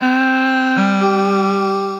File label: Chromatic Voice; Chromatic Voices; Basic Voices; Ableton; Factory Packs; Voice Box